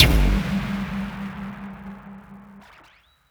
impact oneshot; GhostHack

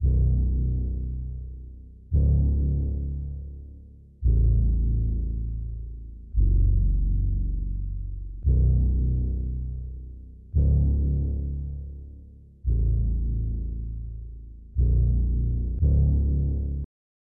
114 BPM F# Min Bass; Loop Stems; Cymatics; Sensualism

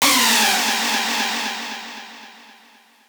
synth screech oneshot F#; Synth Hits; GhostHack

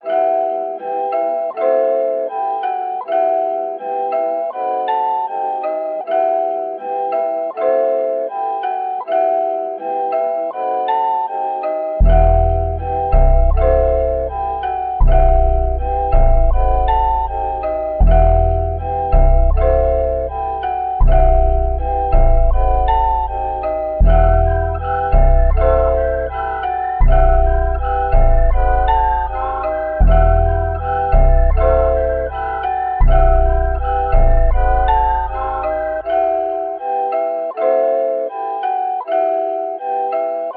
160 BPM E Min, Melody, Trap; Cymatics; 2022 Melody Collection; Trap Pt 2

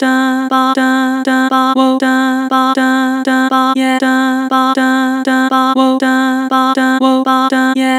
Vibrato Chop, Harmor Vocals

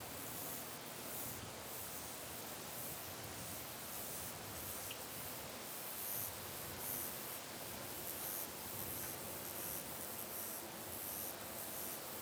Live Recording - Everglades; Cymatics; Orchid Sample Pack

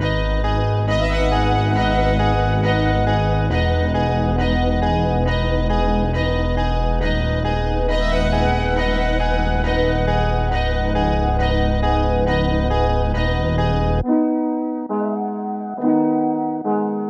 137 BPM G# Min; Black Benz; Cymatics; 2022 Melody Collection Platinum Expansion

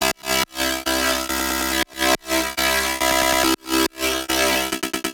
Lead, Dubstep and Trap

